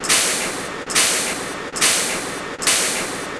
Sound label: steam; ancient